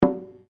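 Drum Taiko Shime Edge piano, Small Japanese Taiko drum; Miscellaneous Musical; Sound Effects:Musical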